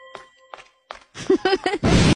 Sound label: laugh then explosion